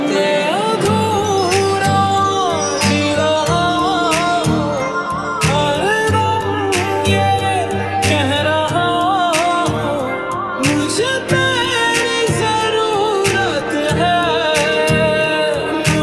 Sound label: Sad Hindi Ringtone